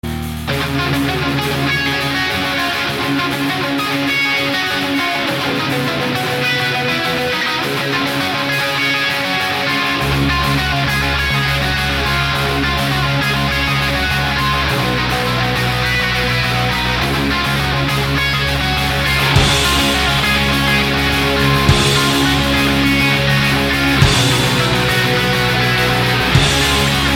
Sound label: heavy metal